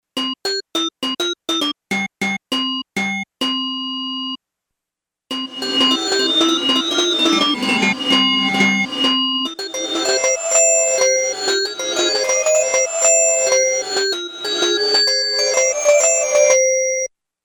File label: reverse, 500 ms